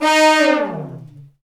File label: BRS, Fat Falls; Roland L-CD702; Fat Section